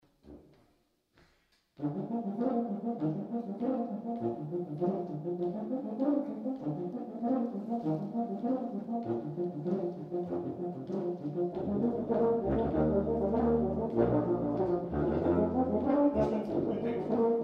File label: Quartett, Euphonium Meets Piano; Live Probe Studio 2020